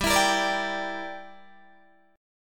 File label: G Minor 6th, Guitar Open D